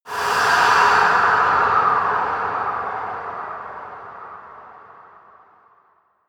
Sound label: air short storm; whooshes rises